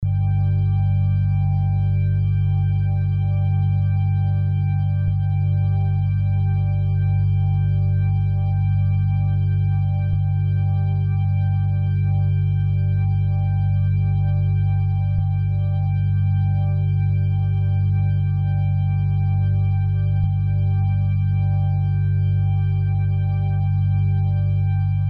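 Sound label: G 95 guitar impro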